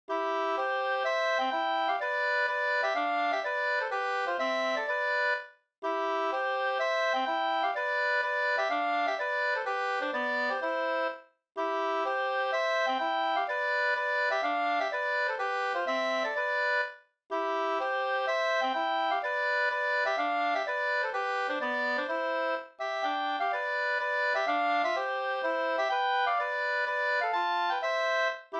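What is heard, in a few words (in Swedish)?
polska, tvåstämmig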